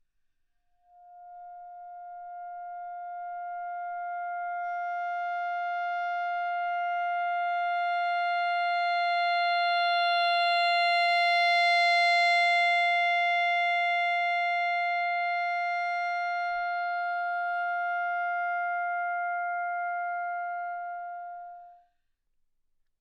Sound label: anechoic modern clarinet bb; OPENAIR